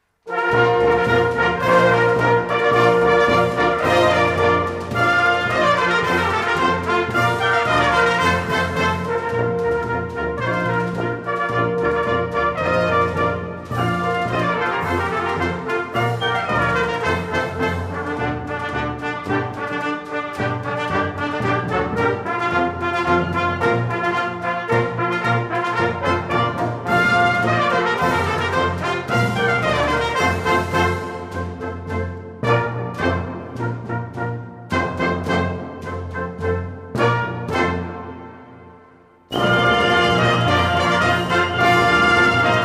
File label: Marsch